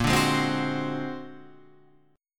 A sharp Augmented 9th; A#+9 Chord {6 5 6 5 7 8} strummed

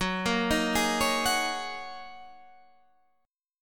Gb 6th Add 9th Gb down; Listen to Gb6add9 arpeggiated

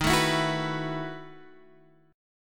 B 11th D sharp, chord

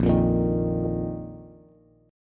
Bb Suspended 4th Bb down Bass; Bbsus4 strummed

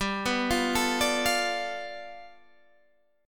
G 9th, Guitar Open D

